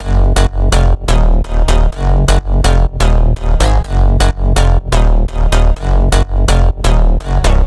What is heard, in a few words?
Big EDM Bass Loop F#1; Future House; Future House Tools; Bass Loops